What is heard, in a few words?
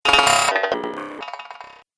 Droid Metal Rattle, Robot Sound Effects